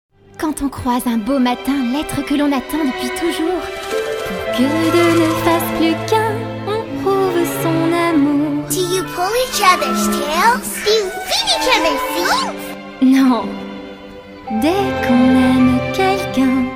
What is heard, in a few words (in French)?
chant; demo chant disney; il etait une fois